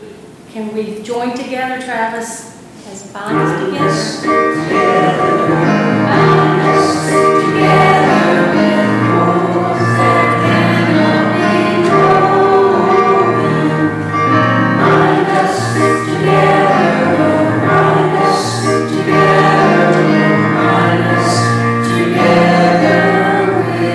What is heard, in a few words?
Closing Chorus; church service; Bethel UMC